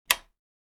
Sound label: switch